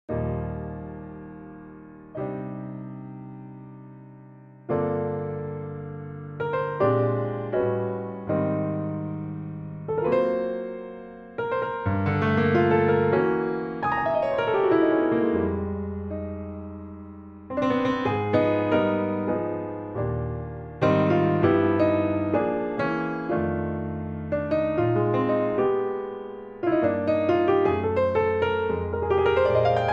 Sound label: Jazz Piano Improvisation